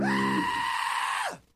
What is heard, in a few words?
screaming; ren hoek